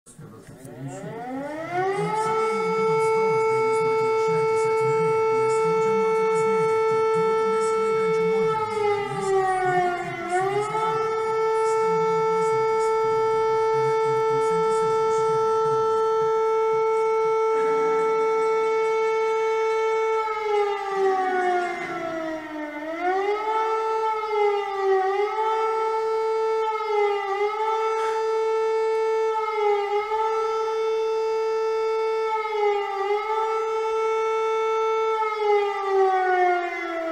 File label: eas alarm, sirenes; lithuania